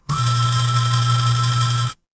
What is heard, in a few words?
office phone vibrate